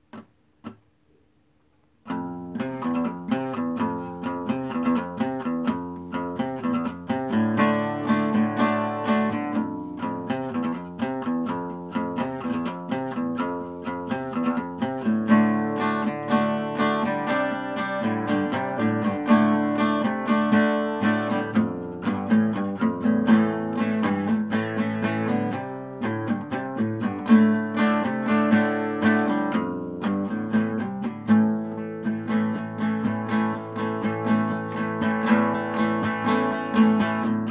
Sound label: acoustic; paleteria el eskimal